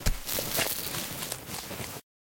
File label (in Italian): Spine Rovi